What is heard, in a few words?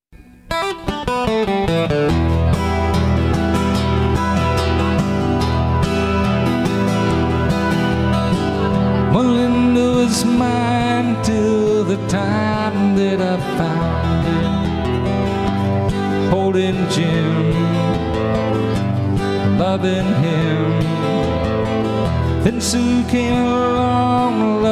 acoustic guitar